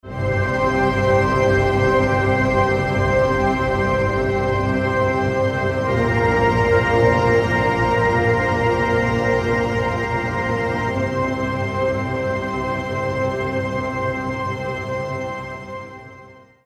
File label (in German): Orchester Spannungsmusik; Jingles for films podcasts